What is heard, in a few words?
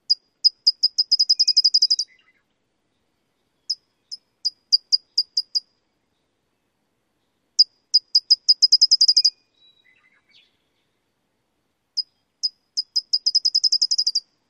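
CALIFORNIA TOWHEE songs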